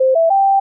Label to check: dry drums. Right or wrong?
Wrong